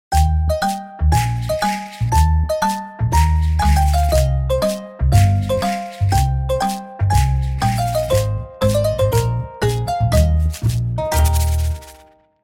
Prehistoric Whistle Tune; bonk brink of extinction

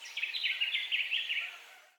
bird call; children of morta

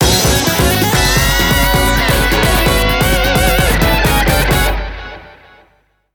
Jingle - Time Up

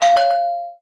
Fld Quiz Ok; The Denpa Men; Android

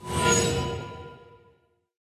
Valkyrie Summon; Barbarians

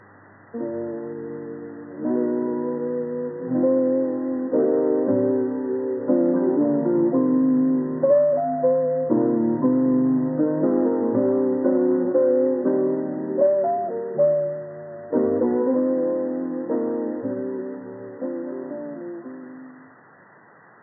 Arcade Tape; Fredbear and Friends Left to Rot

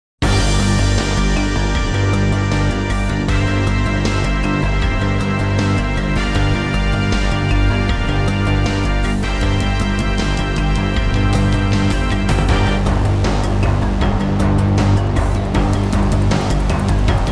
Level Boss; panzer dragoon remake ps4 stadia switch windows xbox one gamerip 2020

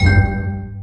Fizz Chain; Candy Crush Soda

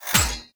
toll bench lower

